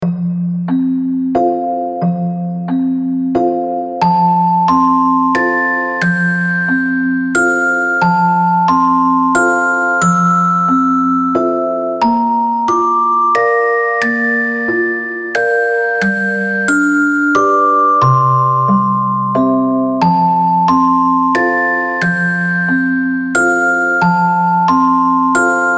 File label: Rock a bye Baby